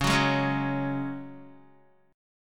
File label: C sharp Suspended 4th C sharp down Guitar; C#sus4 strummed; JGuitar